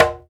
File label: Congas, African Contemporary